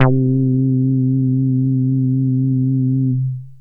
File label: synt, Juno-2; Giga Samples Collection; Bass